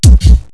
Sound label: explode